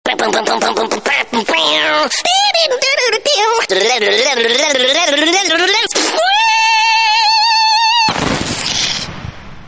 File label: crazy dj frog